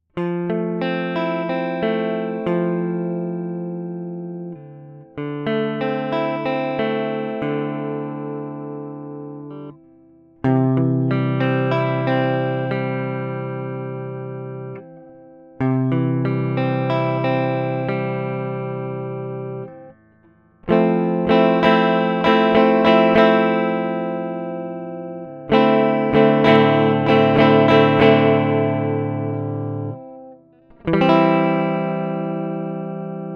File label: SSL Guitar